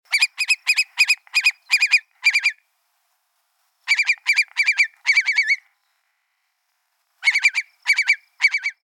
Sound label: Curlew Long-Billed